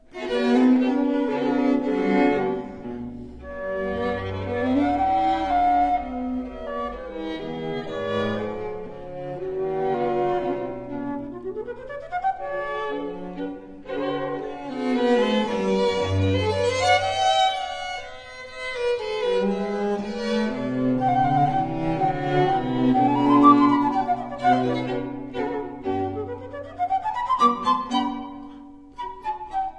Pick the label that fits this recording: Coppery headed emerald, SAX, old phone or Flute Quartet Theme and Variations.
Flute Quartet Theme and Variations